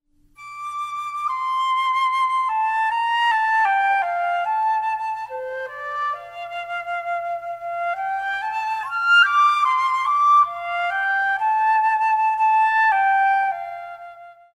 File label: monophonic